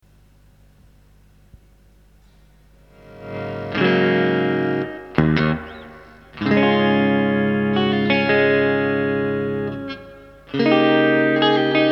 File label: BOSS RV-5 Digital Reverb, SPRING; SPRINGはアンプ内蔵のリバーブ